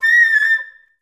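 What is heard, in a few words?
FL ORMI A3, Flute; Best Service; Peter Siedlaczek's Advanced Orchestra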